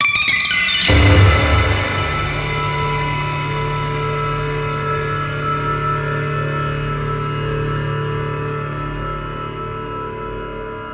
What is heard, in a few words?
crystals Shatter; Perception; PS4